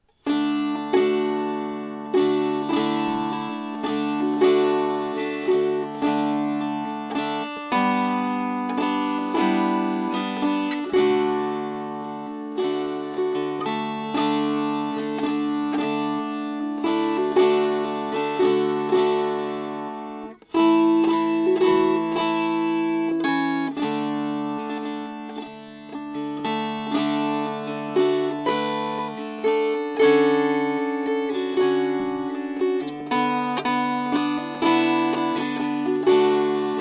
Strummed; Danville Girl

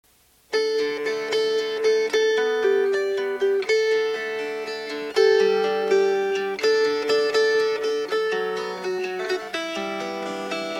Fingerpicked

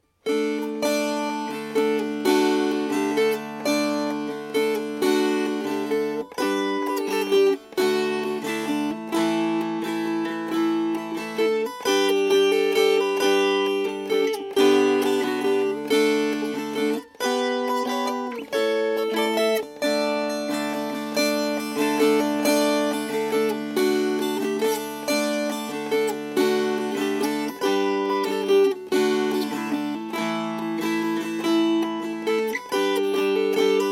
Strummed

